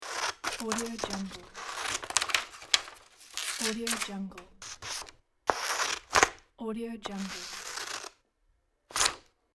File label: scissor